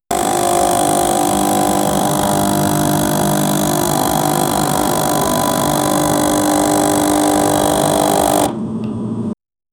industrial coffee machine

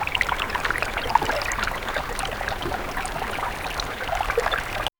the ambiance of lake wild; background ambience

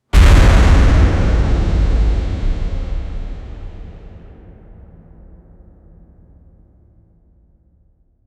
viking horn impact

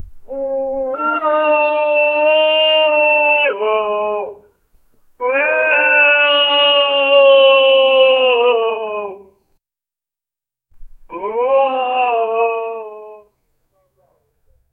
crying, lament; crying of dead christ on

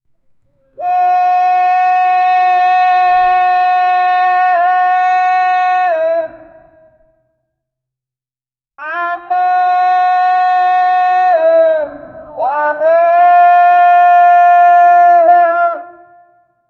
the sound of the muslim, african

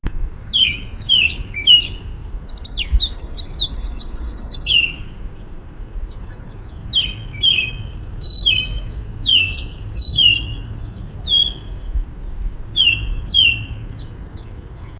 rooster sound and bird sound

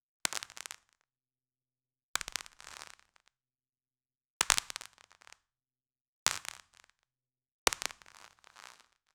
bone crack cinematic

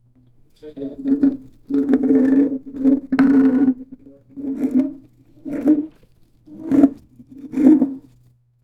sound of a spiked log

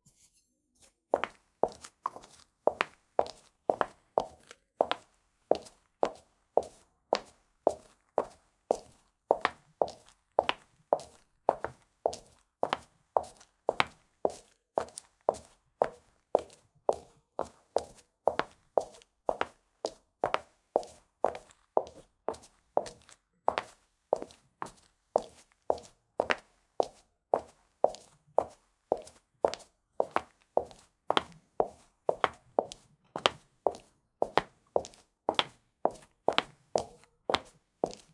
the sound of walking in, clack

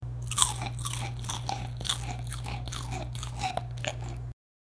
the sound of someone eati, eating

